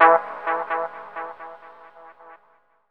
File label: DR. LOOP, TRANCE; X-Static Goldmine 3